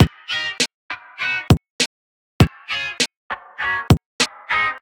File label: R&B Beat, 100bpm; 8-beat R&B instrumentals from sounds extracted from chart song